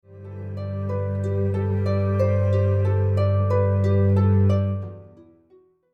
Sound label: Celtic Harp; Water Is Wide